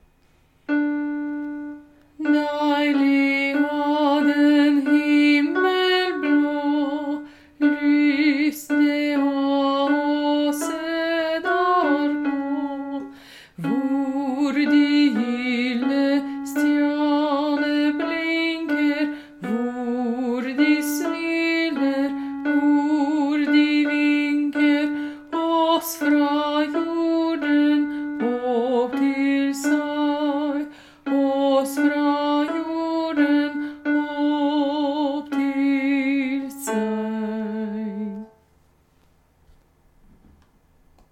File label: Deilig er den himel blaa alt